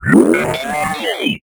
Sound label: Robot